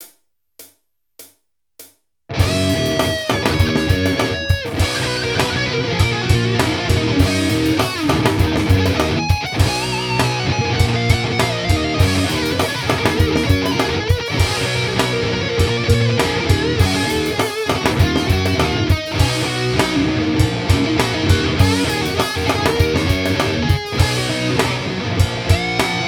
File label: guitarix